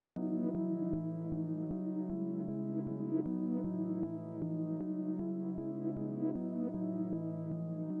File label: Keys Reverse, 155 BPM C# Min; Melody; Loop Stems; Cymatics; Infinity Melody Collection (Preview)